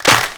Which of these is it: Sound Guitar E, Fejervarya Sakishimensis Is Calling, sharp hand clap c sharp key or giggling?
sharp hand clap c sharp key